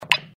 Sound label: orb bounce; Max Steel - Match And Destroy